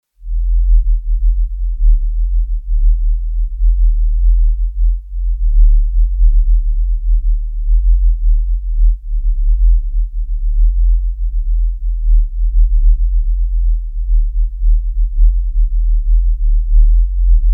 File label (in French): bruit rose